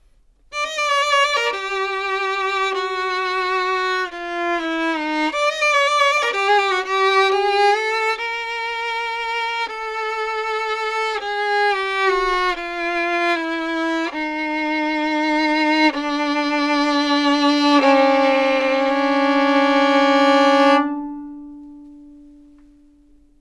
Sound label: violin samples Frustration